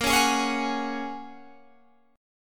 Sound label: A sharp Minor 7th Sharp 5th, Guitar, Standard; strummed; 18,16,18,18,19,16; JGuitar